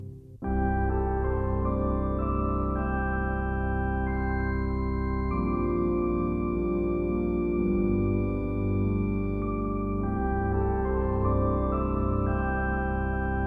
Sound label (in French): postlude d'orgue